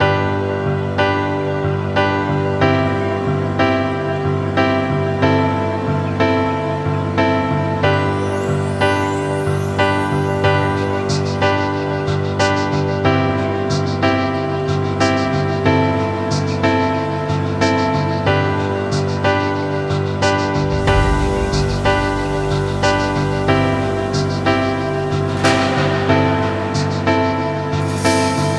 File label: Instrumental